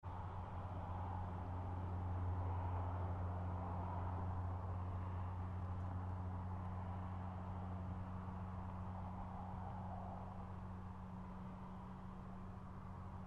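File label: Traffic Country Road 2 Lane Bumpy Medium Far; Backgrounds; Traffic and Cars; Big Room Sound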